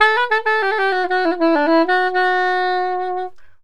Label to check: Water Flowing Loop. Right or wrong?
Wrong